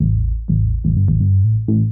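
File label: synth bass, house, 125, A; eJay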